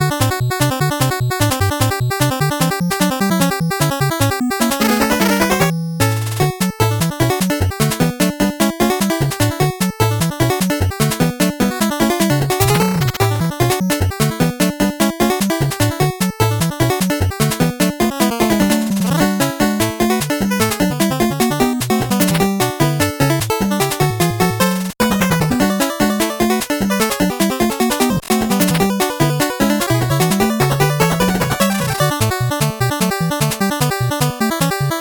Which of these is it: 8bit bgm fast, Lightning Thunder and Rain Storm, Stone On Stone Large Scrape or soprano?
8bit bgm fast